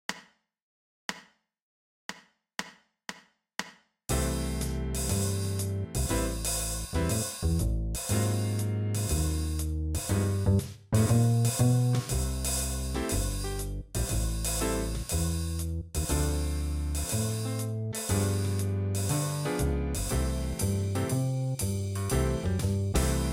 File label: MIDI Only; jazzu